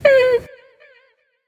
tongue; bellona

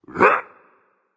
grunt attack berserk; cuchulainn

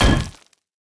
axe groundimpact; Smite; Sobek

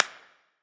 clap; Smite; god Medusa v2

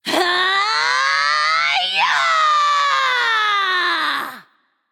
battlecry; smite; izanami

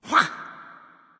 Grunt; smite; loki

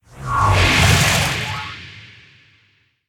whirlpool activate; smite; poseidon

